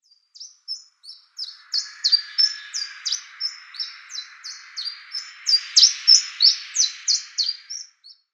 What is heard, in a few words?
Mountain Chiffchaff song